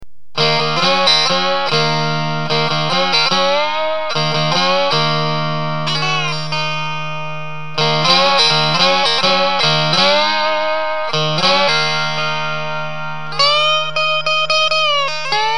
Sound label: tin guitar some distortion; junkboxinstruments